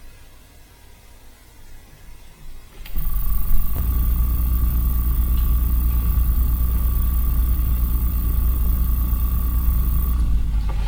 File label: Squeal